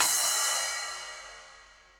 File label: Splash 10 Low Loud Squashed, Cymbal; Drums; Drum Hits; Standard Hits; Ableton; Factory Packs; Session Drums Club